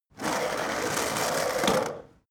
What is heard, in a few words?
skateboard indoors drive light